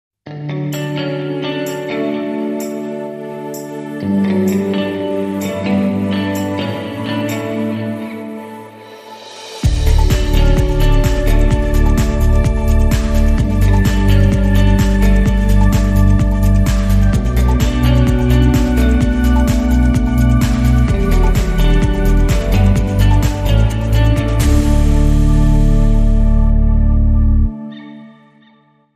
Underscore

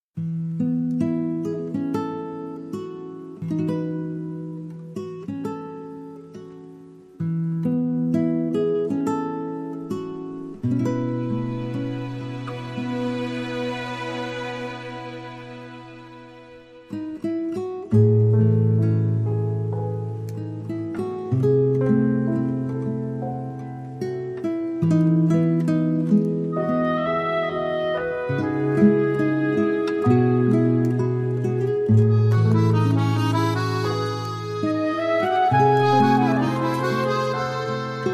Accordion & Ochestra

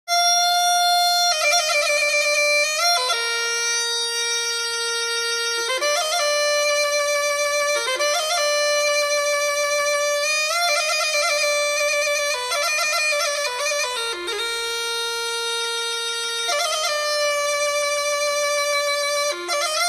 Galician Pipe

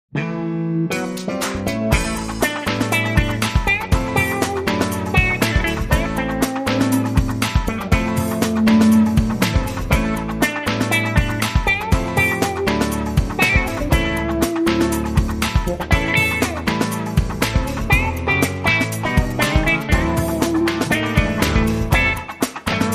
World Watch Contemporary Groove

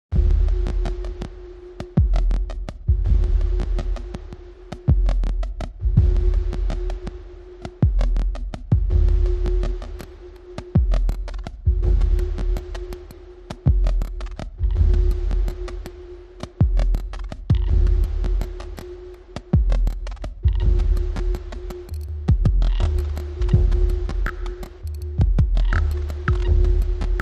Drums

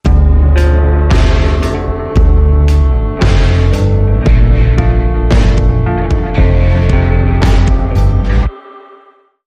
Link Sting

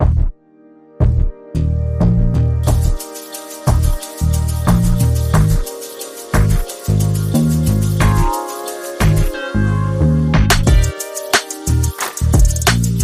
Sassy Saxophone

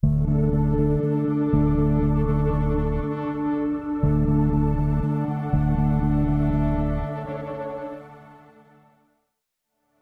Link Sting

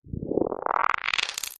Soft Static Transition

